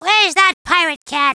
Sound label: Swordz Pickup; Ooh! A big Sword!; Gruntz